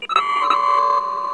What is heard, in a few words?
com bleep